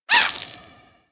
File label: Ice Collision